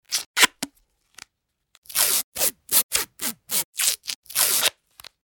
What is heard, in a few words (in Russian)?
Skotch izolenta